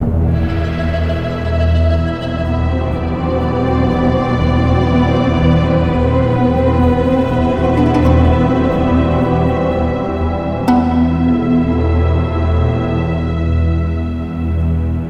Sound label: Caves Melody Loop; Ninjago Monastery; lego universe macos windows gamerip 2010